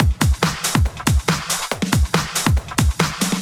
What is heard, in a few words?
Loops BB 140, BreakBeats; Vengeance Samples